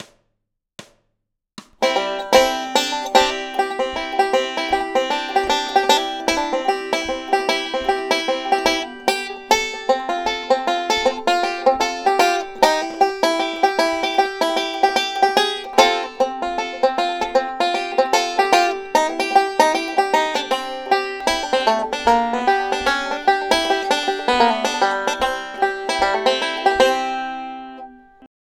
blues; East Virginia Blues

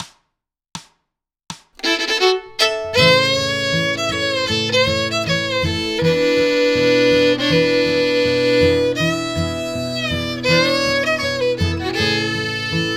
fiddle

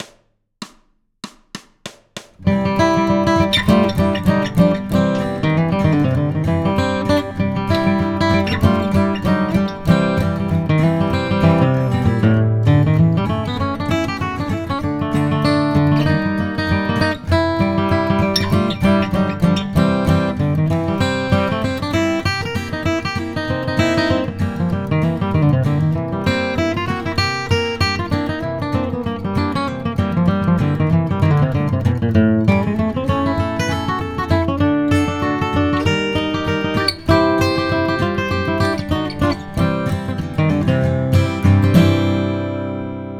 blues, guitar

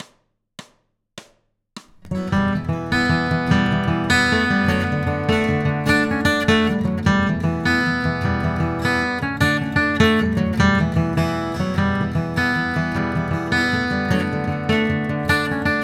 jig; Swallowtail

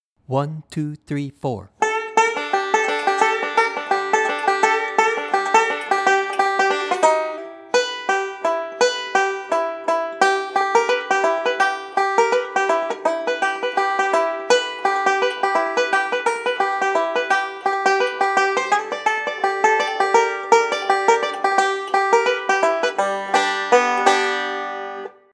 Banjo; Jack Tuttle’s Bluegrass Banjo Primer; his most classic up-the-neck break (1948)

